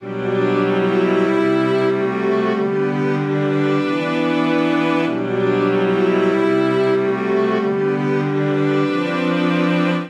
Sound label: Progression Mythical Imaj7 i-IVmaj6#11-I ii-vimin7-bVII#11 G Major 95 bpm, String Quartet; MIDI Clips; Ableton; Factory Packs; Spitfire Audio